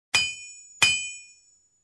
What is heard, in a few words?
Hammering on Metal Twice